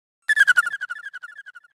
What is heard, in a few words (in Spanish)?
titicutuuuu destello